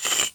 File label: trigger, tictactoe; entities